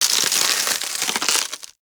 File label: Boss Platform Crack; Warframe; Cephalon Weave